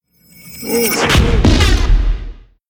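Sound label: giant wolfleader attack; monster; Senillinea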